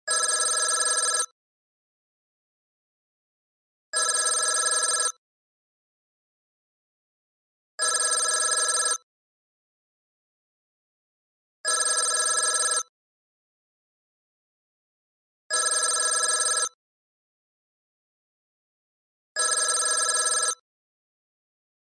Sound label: Old phone